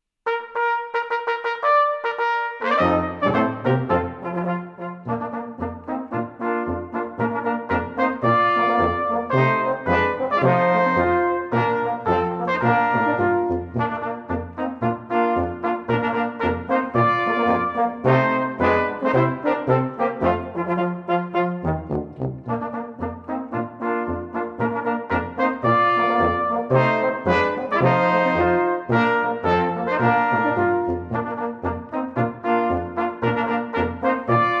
Wachtposten Marsch

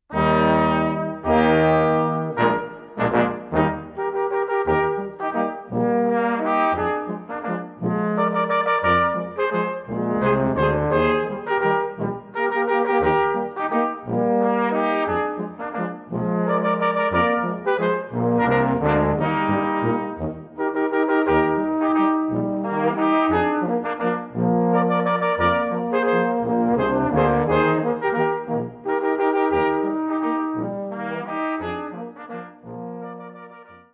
Landler

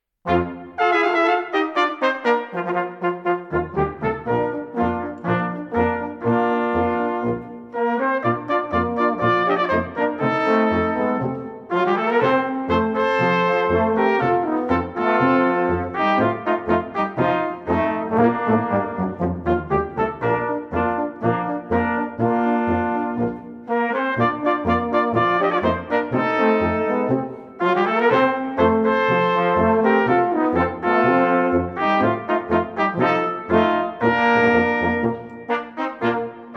Fröhlich Marsch

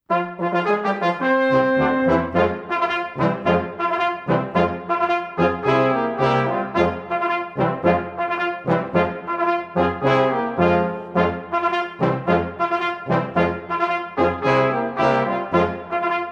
Plattenseer Marsch

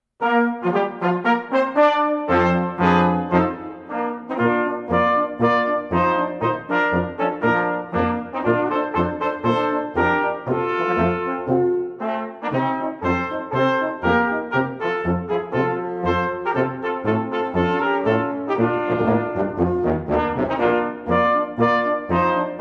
Eder Marsch

